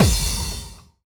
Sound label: KICK, Hip Hop